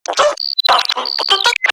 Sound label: Garden Drone Vocal; Zariman Gardener Drone; Warframe